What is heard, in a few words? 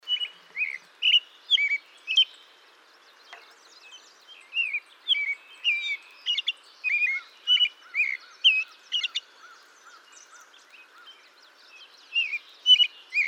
ROBIN American; Stewart BC